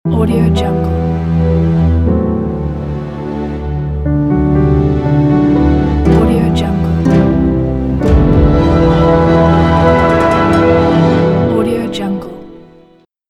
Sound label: Orchestral Logo